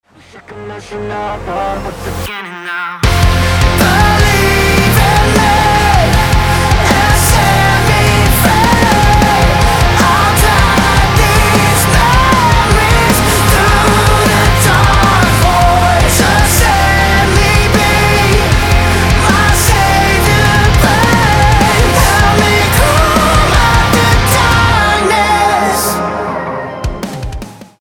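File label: metalcore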